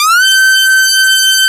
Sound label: SAW GLIDE G5; Kid Nepro; Elite